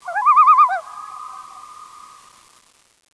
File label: loon